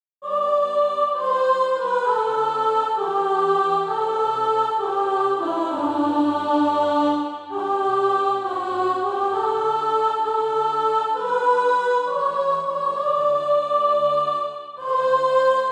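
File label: soprano, Practise Music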